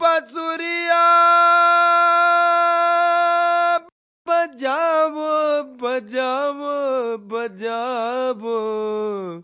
male devotional, Indian Singing; VOCALS and SPOKEN WORD

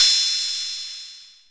Korg KPR-77, drum machine

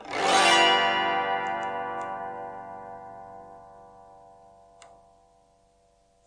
autoharp G7; Multisampled Autoharp Chords; WORLD

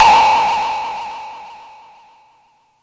rez noise hit; Weird and Interesting Hits

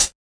hat; Hats

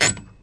advance paper superfast, Typewriter; UNUSUAL KITS and FX; Bauhinian Vibes Typewriter Kit

